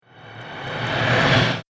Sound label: Industrial Friction Whoosh; Whoosh Sound Effects